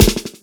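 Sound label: snare roll, Ragga & Dub Reggae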